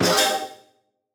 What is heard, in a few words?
Impact FX; Cymatics; Video Game Sample Pack Vol 1; Galaxy Rush